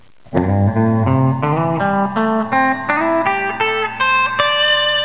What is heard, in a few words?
combo, scales